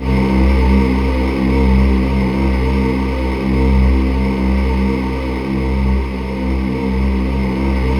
C2, Synth Leads

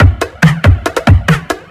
Loops Breakbeat; Vengeance - Essential Clubsounds Vol.2

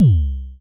FM Kicks C2, Vermona Kick Lancet; LookIMadeAThing Kicks

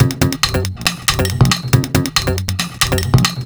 House Bangin, Synchro Funk, Beats; Sonic Foundry (Sony Creative Software)

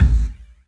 LEDRMK, 3D Drums, LESLIE KICK

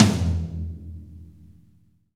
XV Tom Lo, Roland XV-5080

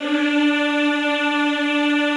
D3, Classical Choir; Best Service; Extended Classical Choir

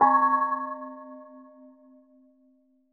gong, Roland MC-909

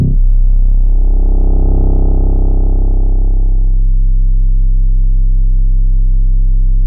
Merge Syn C2, Fairlight CMI IIe Keyboards; Keyboards2

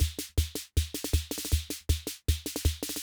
Loop, rhythm king; classic machines

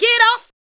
ADLIBS 90; Ueberschall; Jam Box

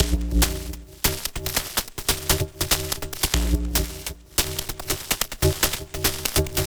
Unstable Groove; Extra Tweak Grooves

